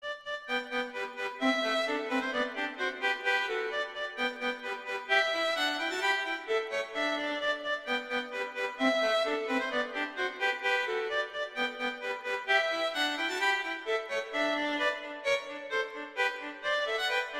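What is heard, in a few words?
Allegretto, violin; Mazas; Duets; Duet 2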